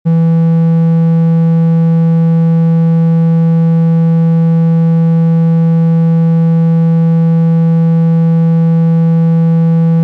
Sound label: E3 164 80